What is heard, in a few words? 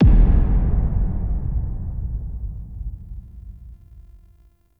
Sub Hit; Ambience; Warframe; Shadowgrapher